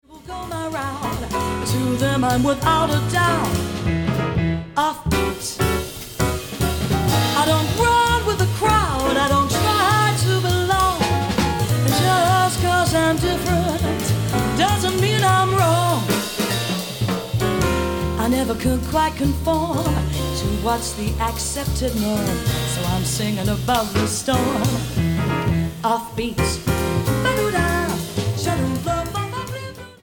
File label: Off Beat